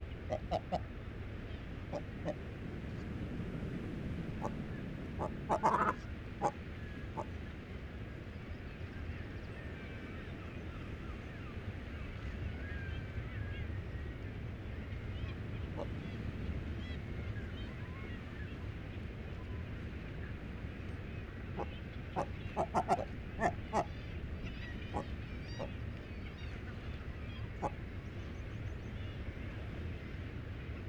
Atlantic Great Cormorant Territory Call Of Presumed Female